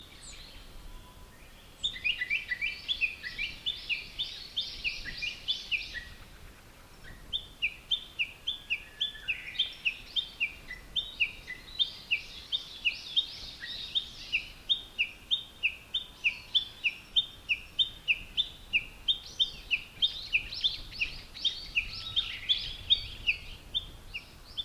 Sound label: birds bush warbler